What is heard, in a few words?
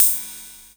KR55 - Crash, Korg Rhyth-55 drums